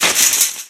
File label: metal chainlink impact soft, physics metal; source engine; left 4 dead 2